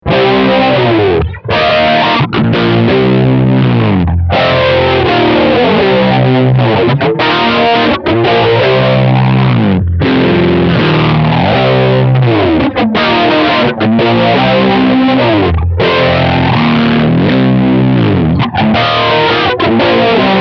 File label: flanger; mobius